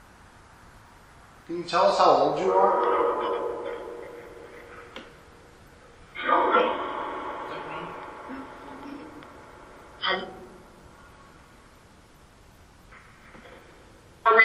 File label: Dungeon Orphanage Phasma; 4th Fl; fairfield county infirmary investigation